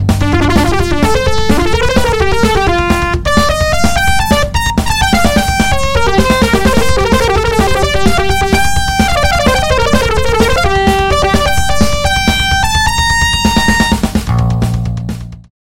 LEAD Brassy; SpheratorFM; syntheway

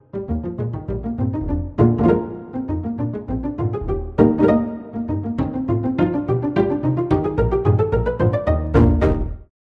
Strings Contrabass Pizzicato; Synthphonica; Syntheway

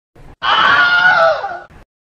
AHHHHHH

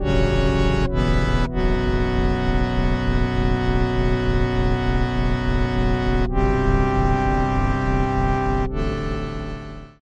Sub Synth; Waverator Spectra; syntheway

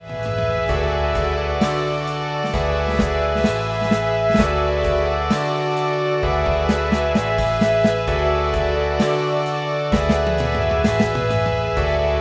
PAD Cinematic Synth; Synthractive; syntheway

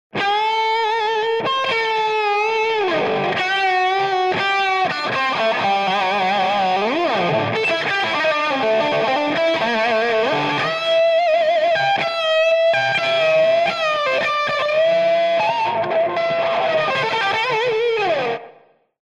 Dual Delay Tube Driven Tape Echo Dry; ClonEchoes; Syntheway

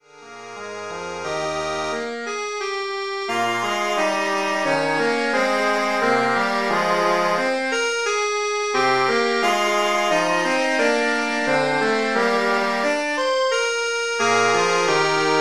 Reed Organ Communion For Organ; 1856; Organux; Syntheway

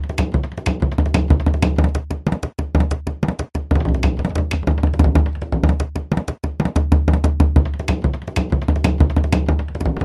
Irish Bodhran Frame Drum; Drumwavy; Syntheway

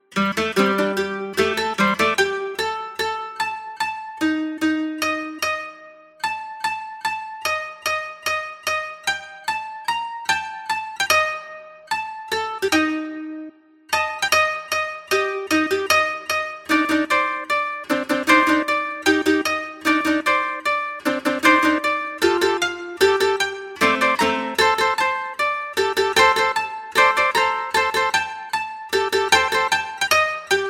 Koto; ShamiKoto; Chidori no Kyoku; Yoshizawa Kengyoo

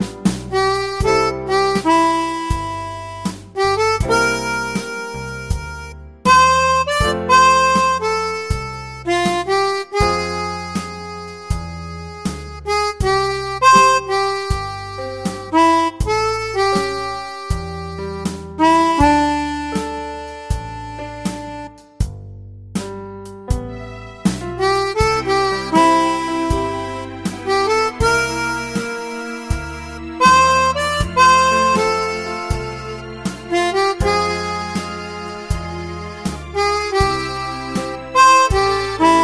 Harmonica Natural; Akkordica

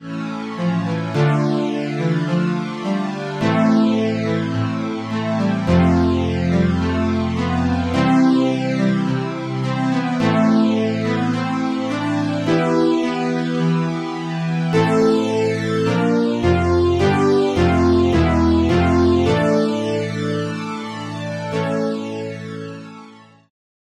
Memorymoog Strings; Synthphonica; Syntheway

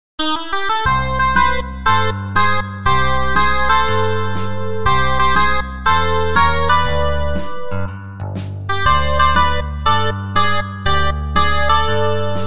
Electric Clean Guitar; GuitarTempus; Syntheway

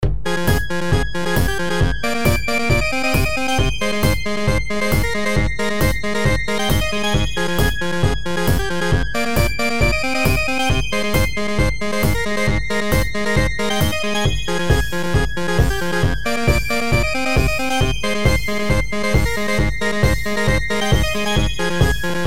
Percussion Kit; Zephyrus; Syntheway

